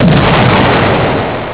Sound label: Explosion; kraken-game